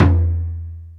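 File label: R8-PRC, R8 percussion; E-Lab; X Static Goldmine 1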